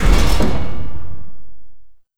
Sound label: step; gdi